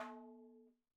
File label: madalam notes R; Sample Banks; Percussion; MADHALAM